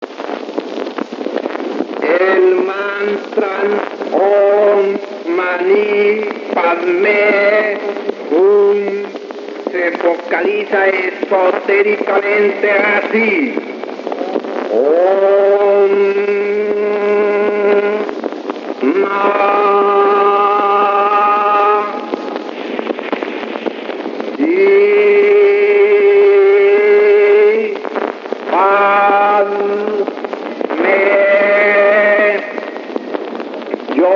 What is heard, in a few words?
Om Mani Padme Yum